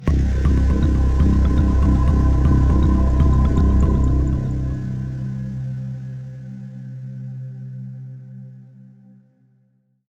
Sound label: Day Amb Stinger, gamerip; dying light